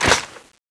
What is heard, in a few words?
m249 shoot, weapons